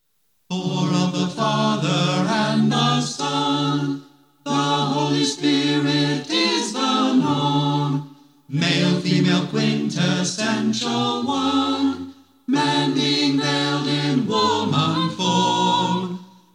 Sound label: Anthem Unison Chorus; Hermetic Library; dionysos